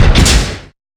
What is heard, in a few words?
blast door; civ13; civ14; Machines